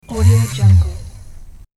motor shortened more

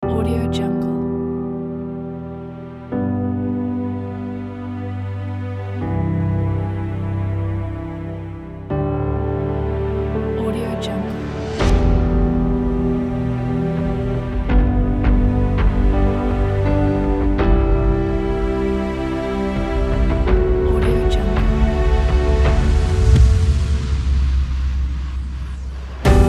intro epic

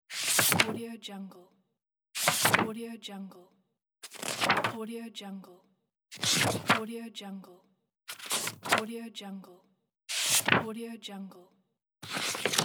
book page flip

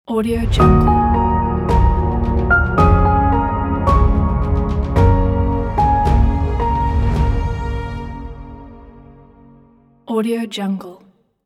epic cinematic logo

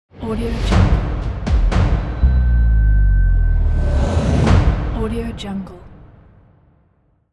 powerful impact logo

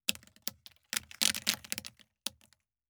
debris peanut shells hard surface

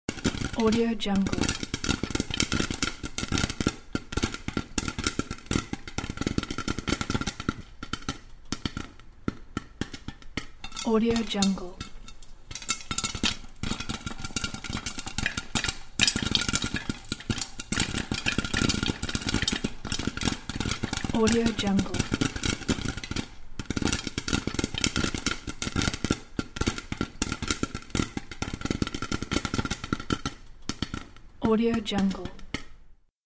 popcorn

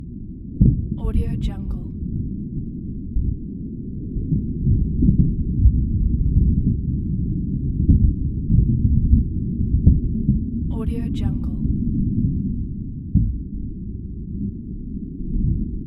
underwater moving loop

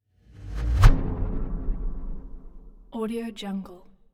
trailer

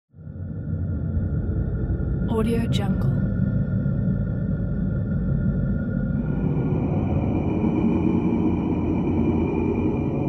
arctic wind and cold

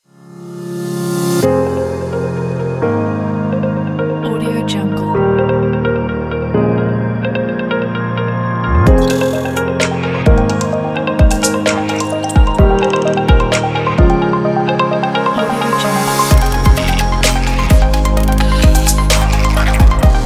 inspiring glitch digital corporate technology

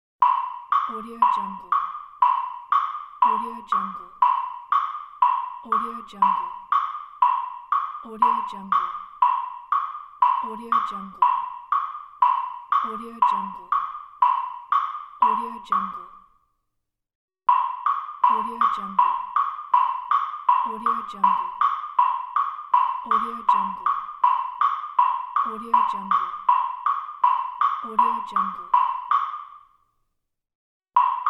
cartoon walking

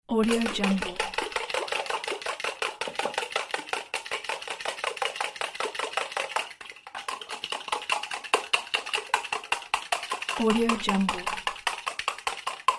beating the eggs

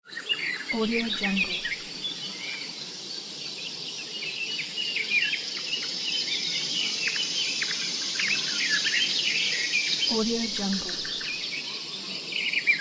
birds nature

